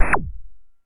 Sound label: Video Game Fire Effe